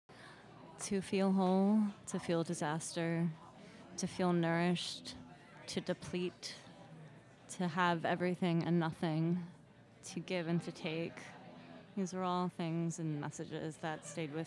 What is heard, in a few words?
Audience Recording; a-meal-premiere